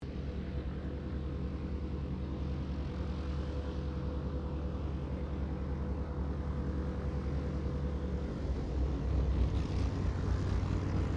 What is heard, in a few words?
AIRCRAFT HAWKER SEAFURY TAXI DISTANT, Hawker Seafury fighter-bomber; Airplanes; Vehicles; Aircraft Collection; Twistedtracks